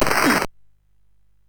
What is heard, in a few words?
sid MOS 6581R2